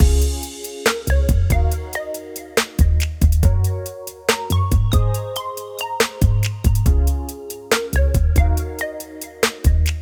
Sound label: hip hop groove